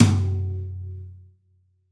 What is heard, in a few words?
New Brush Tom, Roland BK-5